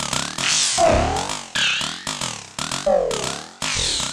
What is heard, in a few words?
Predication Plasma Kit - 116 bpm, drums; MIDI Clips; Ableton; Factory Packs; Mood Reel